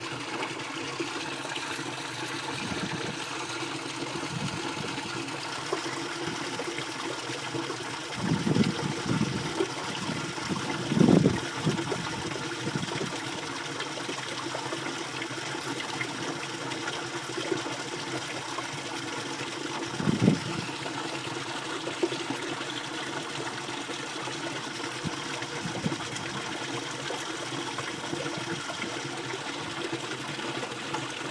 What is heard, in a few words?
hill burn; tulloch hill